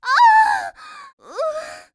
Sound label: Death; Sapi